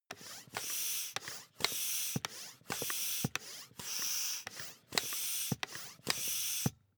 air pump ball sequence fast

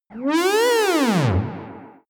small ufo movements

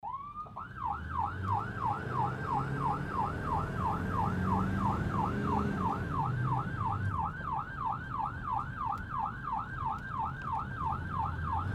ambulance inside

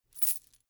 chain mail armor movement

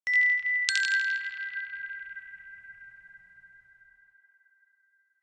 notification glockenspiel rattle third down

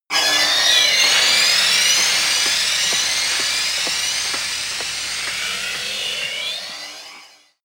techno beat high

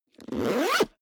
zipper backpack close accelerate

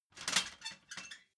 ladder metal handling

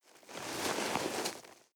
nylon leather soft

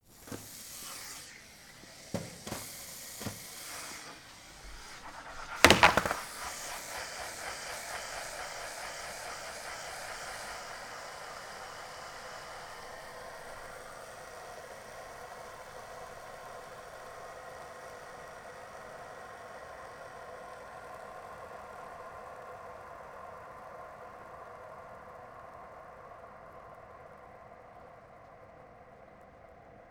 skateboard wheels spinning long

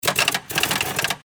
auto switch

